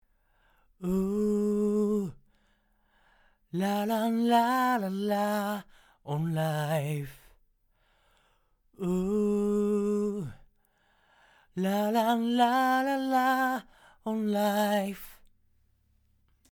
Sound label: Vocal